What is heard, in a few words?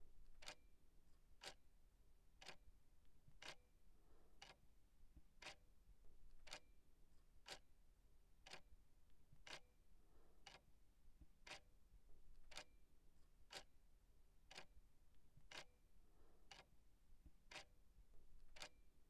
Crude Old Ticking Wall Clock